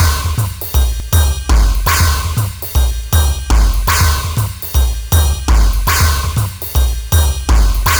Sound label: cymbal init 120bpm